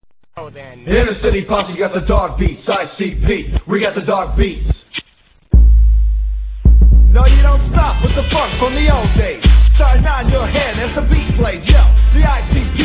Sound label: dogbeats